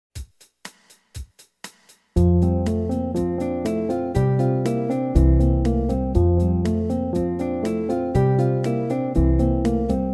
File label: Scale Etude In F, piano; Op. 101; Beyer